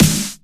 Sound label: snare subdivided